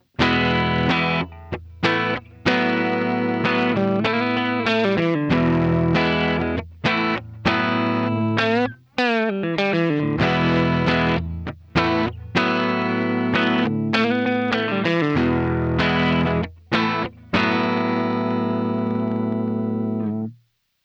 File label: Sustain Full Depth; Orange Gitarren Effektpedale